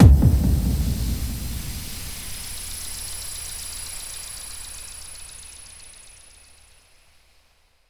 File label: Fall, Hits & Falls; Zero-G Trance Inducer